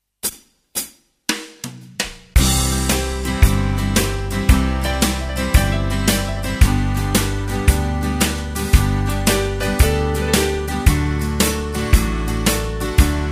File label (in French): chant accordeon; play-back